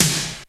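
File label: Disco snare drum, Roland JD-990; Drum Samples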